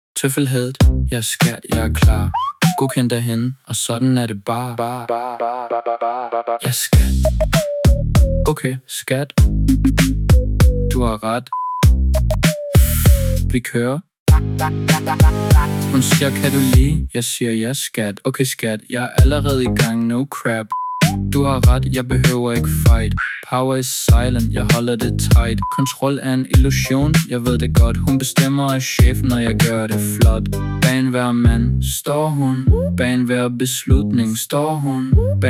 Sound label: ringtone